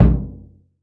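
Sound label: bass drum HRD, 30S JAZZ; Northstar - New Gold VOL-1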